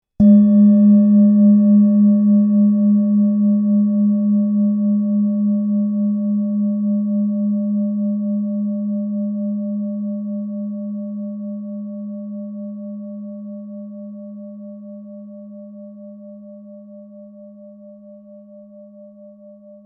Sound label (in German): klangschale uranus 702g